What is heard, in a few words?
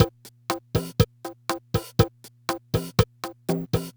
Loop, dd-5